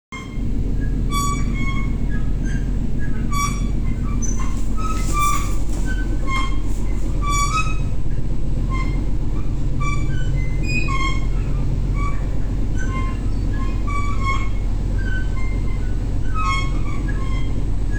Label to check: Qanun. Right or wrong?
Wrong